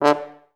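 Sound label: FHS1 staccato D2, Advanced Orchestra; Best Service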